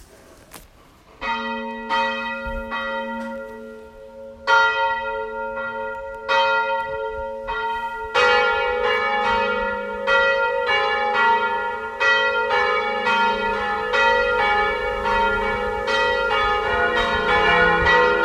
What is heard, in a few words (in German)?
Glockengelaeut Laar; herford